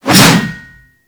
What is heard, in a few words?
Axe Swing; Warframe